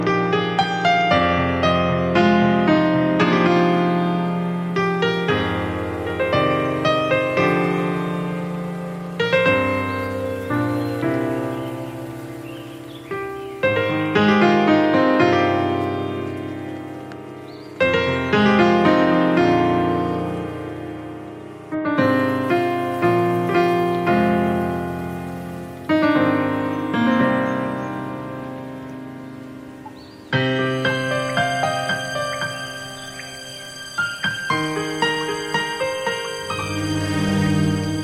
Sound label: Saxophone